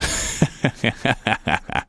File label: heh heh heh; Postal Dude